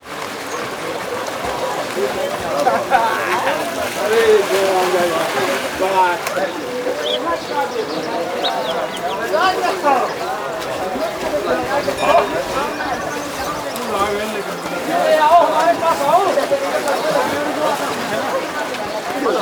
BENARES, AMBIENCE; USB Soundscan vol.45; AKAI

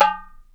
tik, pitch perc; East West - Global Sounds

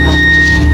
Organ Plus Feedback, wavetables; Animoog

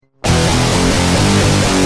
Guitar Rage, Industrial

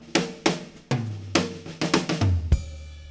Fills 102; Zero-G; Monster Beats

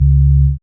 dance bass; Sound & Vision - Gigapack II CD 1

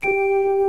60's Organ; OMI - Universe of Sounds; EII Factory Library

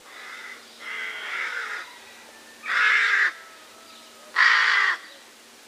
Birds Various; outdoor

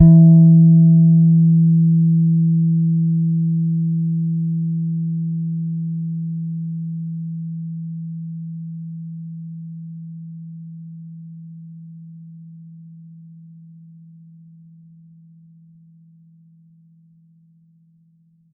E4 soft, Pedulla Thunderbass, Bass; Giga Samples Collection

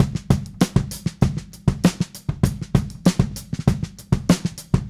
Smooth Groove Drums, 70s Analog Funk; Sonic Foundry (Sony Creative Software); What it Is!

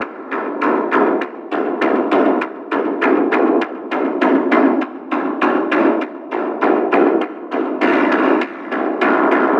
100 Perc War Drums; Percussion; Zero-G; Future Wave

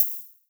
HH, HIHAT

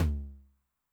Noise Med, 909 LOW TOM

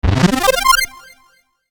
Arpeggio C, Techno Synth; Sonic Foundry (Sony Creative Software); Techno Synth Loops 1 Signals I

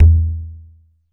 CONGAS, DRUMS; Music Store Sound Service; Einstein

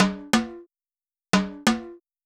junkyard rhythms, drums; Sonic Foundry (Sony Creative Software); Vital Drums

